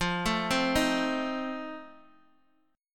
F 7th F down Guitar Open D

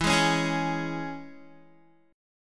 E 6th, Guitar; strummed; chord; 11,11,13,13,13,x

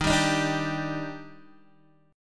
Eb Major 7th, Guitar DADGAD

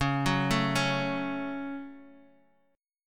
C sharp Suspended 4th Sharp 5th, Guitar, DADGAD

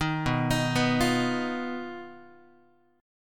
B Major Flat 5th B down Guitar; BMb5; arpeggiated

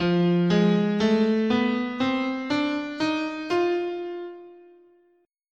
F Hungarian Major, scale

